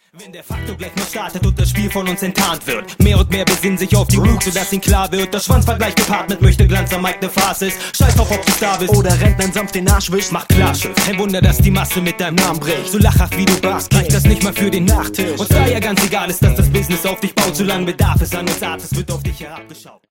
sprechgesang rap ausschnitt

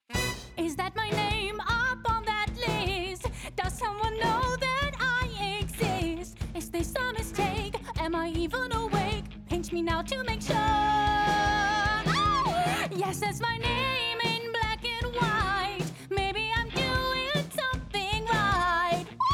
GESANG HELL KERNIG

